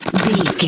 ui text type scroll effect

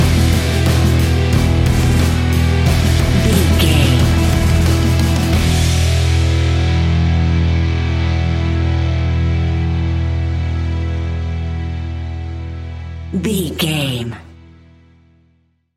epic heavy metal orchestra stinger; Catch 22 Music Network

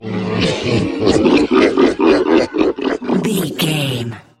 troll monster laugh

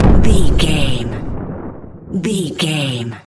explosion deep low

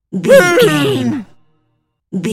seal walrus death

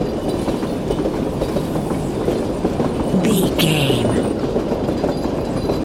train tracks loop

